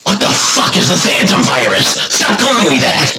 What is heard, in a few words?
Game Over